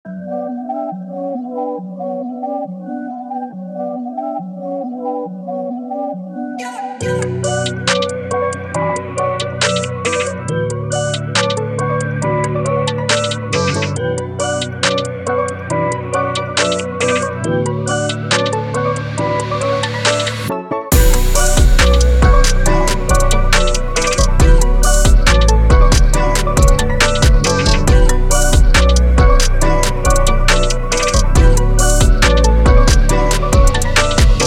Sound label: Trap